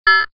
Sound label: beep